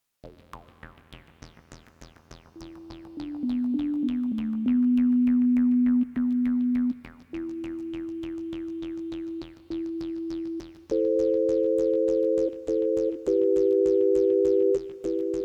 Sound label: synthesizers; MegaEpix Enormous